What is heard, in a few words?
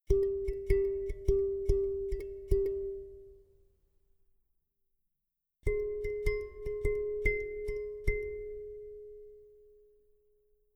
Rhythmic motifs on single pitch (transposed each time)